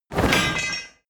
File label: drop metal blade large